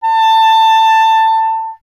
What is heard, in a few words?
clarinet A, Solo Instruments; Miroslav Vitous - Solo Instruments VOL-1; 90 s Sample CDs